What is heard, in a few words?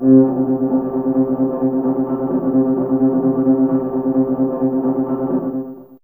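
Violin Tremolo C3, Fairlight CMI IIe; TremStr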